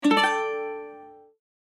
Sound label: Medieval bard mandolin strum